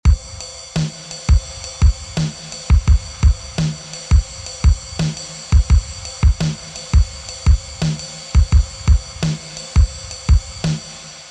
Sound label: drums dry; phonk drive by elektra magika